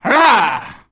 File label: afflicted f attack